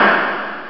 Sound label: Expl; ST-XX Amiga Soundtracker Sample Packs